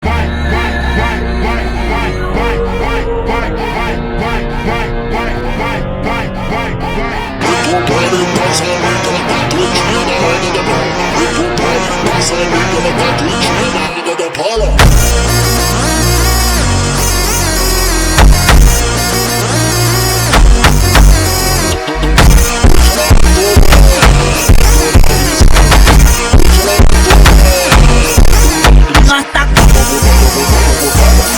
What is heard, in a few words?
funk phonk